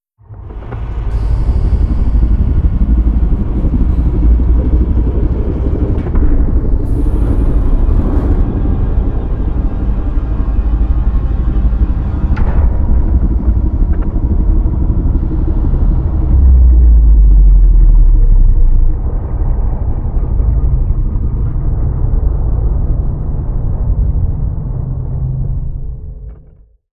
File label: reptilian colossus closing; apocalypse